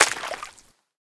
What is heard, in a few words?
marsh step; Golden Crayon Sounds